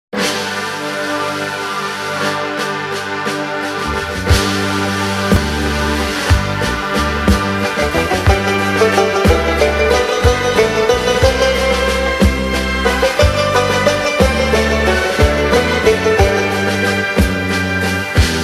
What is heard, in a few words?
german folk song